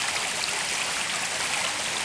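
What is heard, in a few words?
fountain large loop; water splashes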